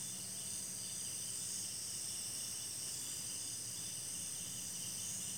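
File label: shoots jungle loop; ambient; nature; Counter-Strike Global Offensive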